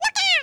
yell; Matt Hoffman's Pro BMX 2; XBOX

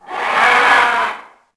Call, Dino

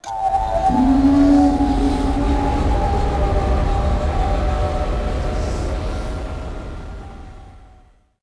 Fighter move, vehicles; naboo

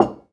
Place Crystal; Myst III Exile; MALL

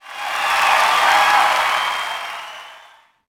Cheer, Crowd, reactions

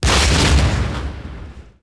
explo laser hi; impacts explos

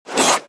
droid door; movers

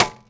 bouncy tv hit; bouncy objects; Tony Hawk's Project 8; XBOX

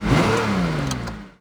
car rev; Matt Hoffman's Pro BMX 2; XBOX

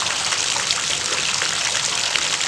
barrel leak; Tony Hawk's Project 8; XBOX

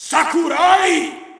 Shout, audience; UFC Tapout 2

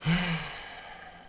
sigh; Pirates Of The Caribbean

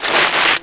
bmx skid; terrains; Tony Hawk's Project 8; XBOX

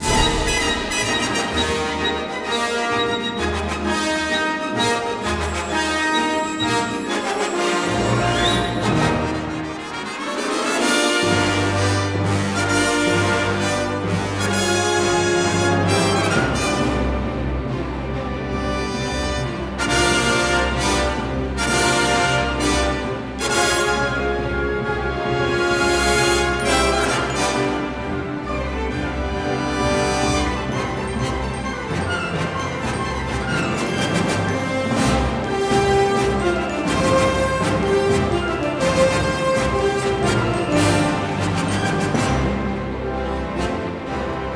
credits, music

